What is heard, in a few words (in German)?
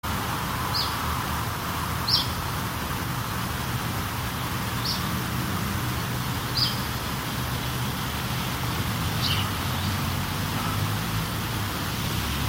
Zilpzalp